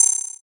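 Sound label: bell; suzu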